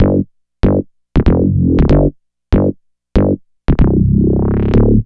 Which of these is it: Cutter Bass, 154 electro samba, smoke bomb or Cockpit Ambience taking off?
Cutter Bass